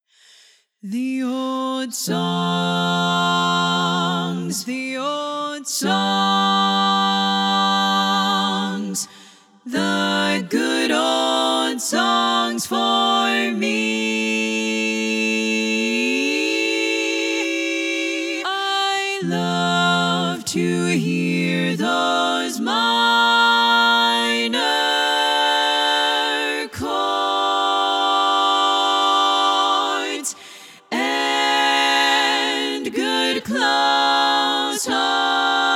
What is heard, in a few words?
The Old Songs SSAA Full Mix